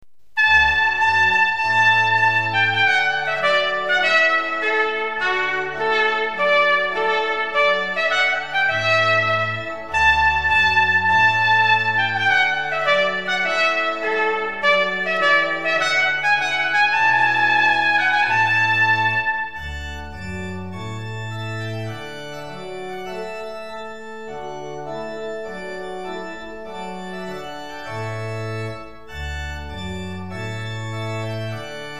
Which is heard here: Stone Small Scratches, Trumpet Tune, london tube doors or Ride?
Trumpet Tune